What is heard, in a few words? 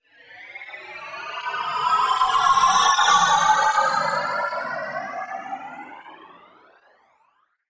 Phased Tube Sweep